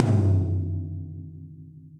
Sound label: Tom Low Low Loud Squashed; drum hits; Ableton; Factory Packs; Session Drums Club; Standard Hits